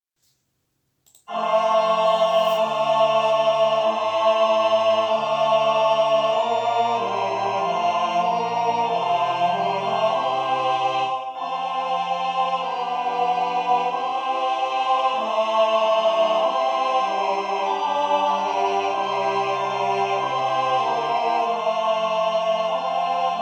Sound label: tenor; Sanctus